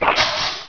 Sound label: zombie idle; sven; zombie hev